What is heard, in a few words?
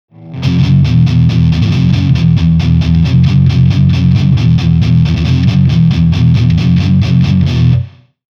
death, amplitube metal; ik multimedia